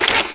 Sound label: menu; Heretic II Demo